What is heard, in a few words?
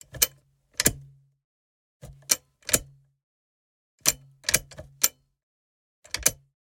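Mechanical Click Tools Metal Snapping; Industrial Robot; Mono; Justsoundeffects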